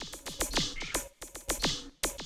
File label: Classic DrumLoops, 110